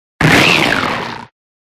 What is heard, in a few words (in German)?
Ruf